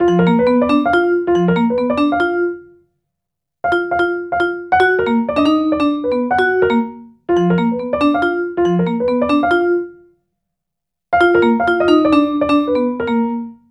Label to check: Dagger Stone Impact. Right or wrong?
Wrong